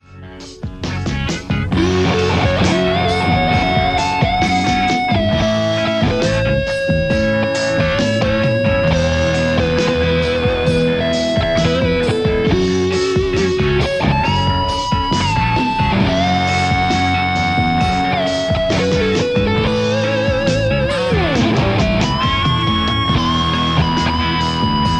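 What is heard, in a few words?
Stratocaster; overloud th3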